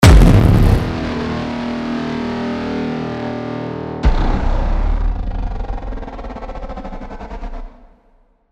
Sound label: Impacts Mids; Gravity 2